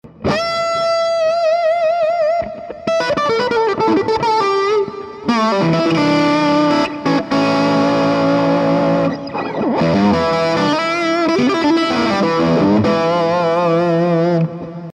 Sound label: Bridge gain, guitars